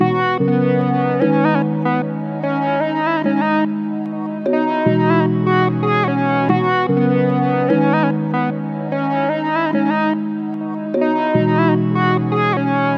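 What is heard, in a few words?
148 Drippy Loop Ab minor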